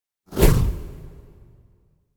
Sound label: Whoosh Heavy Air Rip Tiger Growl, Drum End - Short; Hollywood Edge; sound designer's toolkit v2